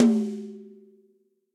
Tom High Cold Tight Room; Drums; Drum Hits; Ableton; Session Drums Studio; MPE

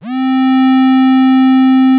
Poly Synth, Vector FM; Play Keys

